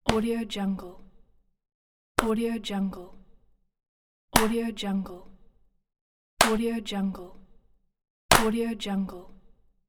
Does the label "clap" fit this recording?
Yes